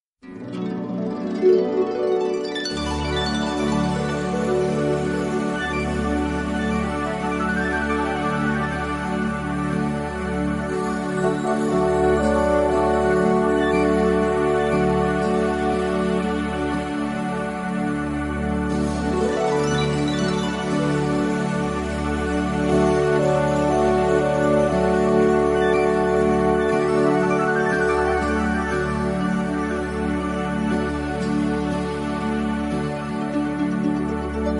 Waldlichtung Entspannungsmusik

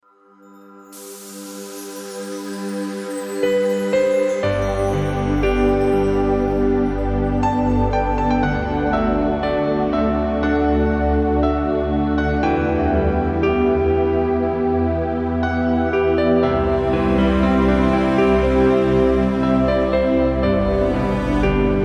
Piano Traeume

